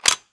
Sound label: charger back